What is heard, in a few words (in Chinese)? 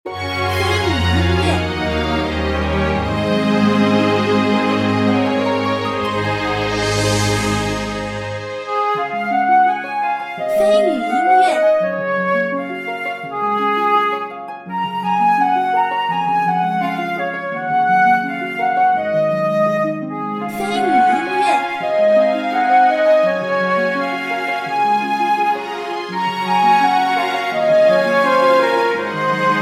城镇音乐